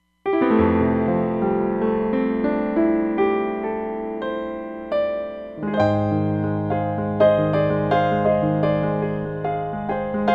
Grand X

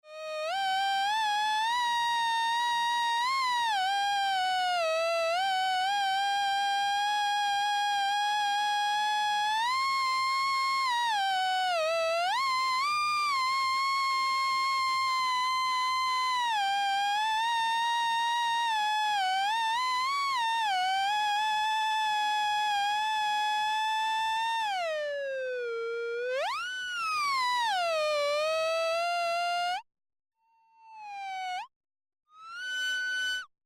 Square-amin, Alesis airSynth

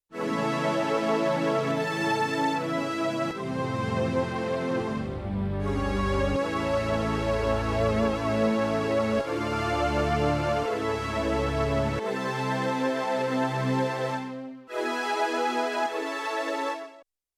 Airspace, Synth Set, Yamaha TX16W

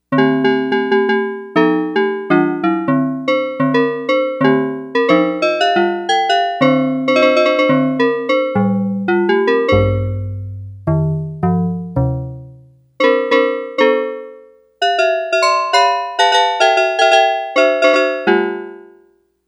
SteelDrums, Yamaha DX100; Factory Patches

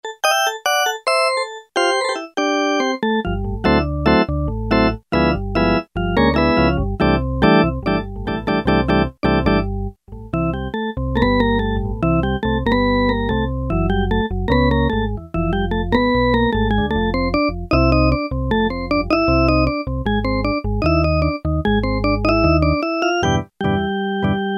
E.Organ, Roland U-110; Factory Patch

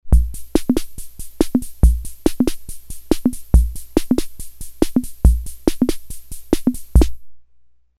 MIXED, Ace Tone Rhythm Ace FR-2L

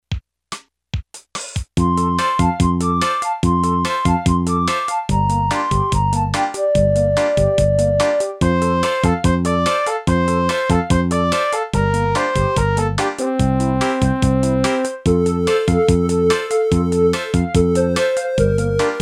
SING, Yamaha PSR-300 Factory Demo Songs

